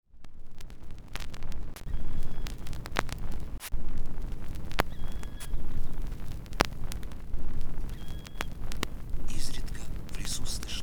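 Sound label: bird voices in nature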